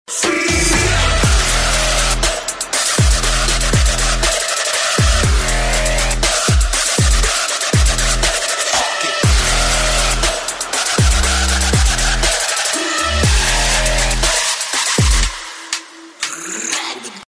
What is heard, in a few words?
Trap & bass mix sound